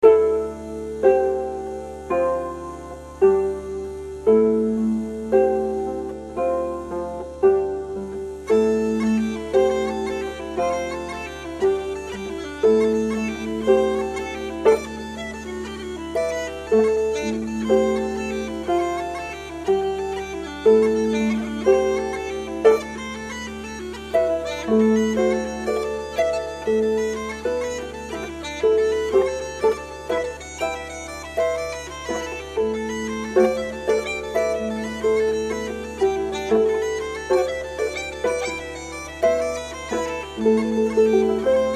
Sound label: pipes